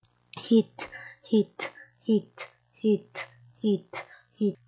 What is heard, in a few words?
hit